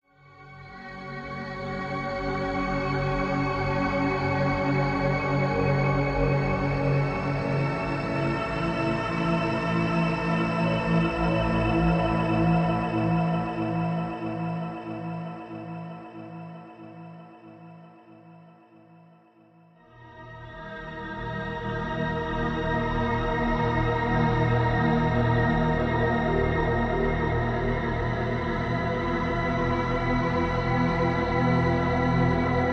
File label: interlude, ambient; Factorio - Space Age